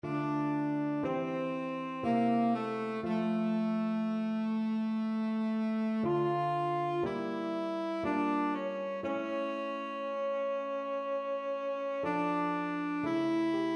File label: tenor saxophone